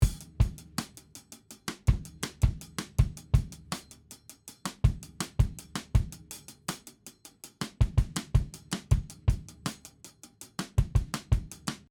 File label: break 81 BPM